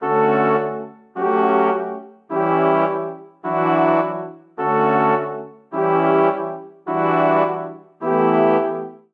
Progression Carefree At The Beach D Major 105 bpm, Brass Quartet; MIDI Clips; Ableton; Spitfire Audio